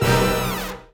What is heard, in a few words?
Combo Stab; Bee Movie Game